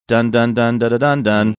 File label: singing bass line